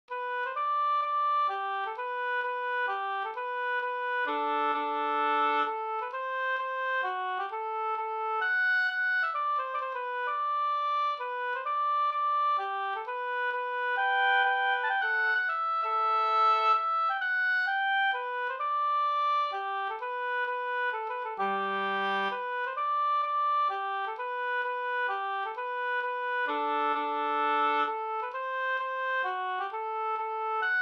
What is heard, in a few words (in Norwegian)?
pols Rorospols; e Olle Dahl